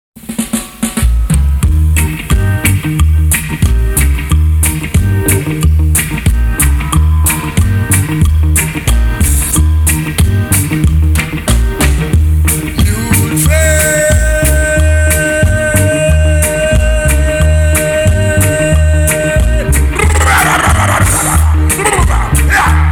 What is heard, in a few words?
Reggae Style